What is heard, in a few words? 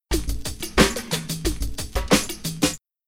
Rhythm HipHop Kick